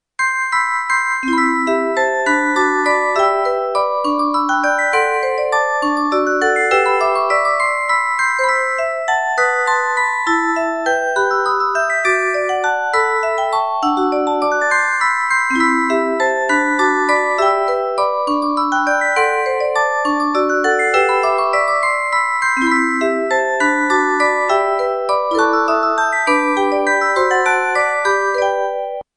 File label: waltz, jazz suite